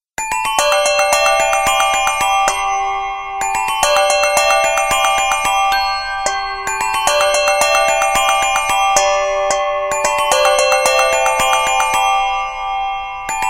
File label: lenovo a6000 tone